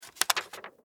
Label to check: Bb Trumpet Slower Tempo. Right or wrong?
Wrong